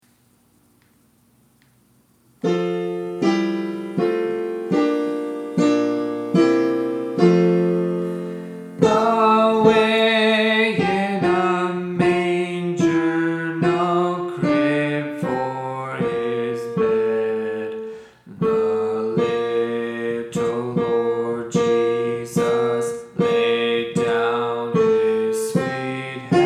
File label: Alto